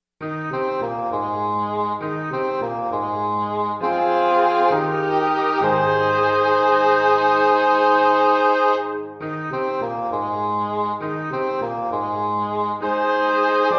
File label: Barcarolle G, piano; piano-lesson; burugmuller; 25etude